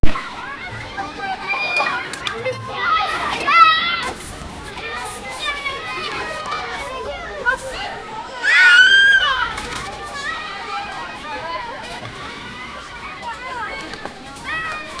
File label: kids playing in a playgro